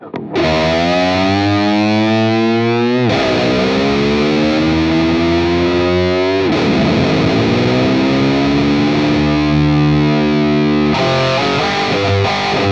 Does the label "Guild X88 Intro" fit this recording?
Yes